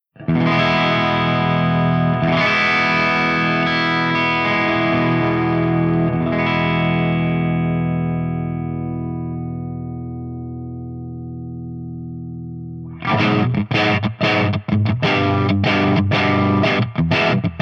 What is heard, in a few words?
ENGL CH1 GAIN BOOST V30